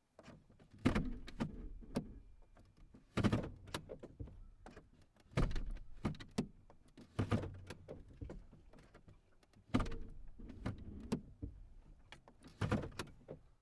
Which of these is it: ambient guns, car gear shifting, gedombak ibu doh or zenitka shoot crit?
car gear shifting